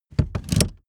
car seat slide backward short

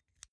insert xlr extension; objekte

